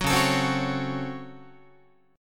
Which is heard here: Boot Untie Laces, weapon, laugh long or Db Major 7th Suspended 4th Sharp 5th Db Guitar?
Db Major 7th Suspended 4th Sharp 5th Db Guitar